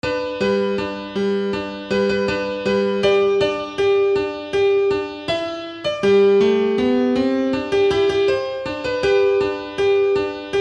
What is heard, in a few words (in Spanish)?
Acuérdate de Él Piano